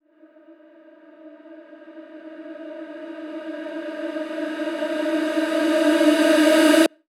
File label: FX Uplifter